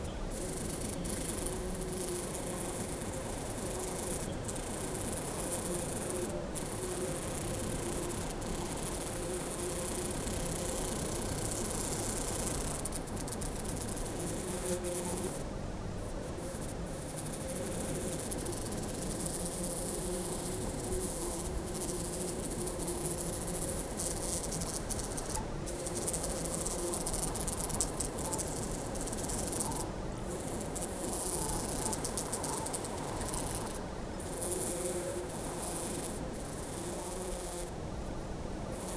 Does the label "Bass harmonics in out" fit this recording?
No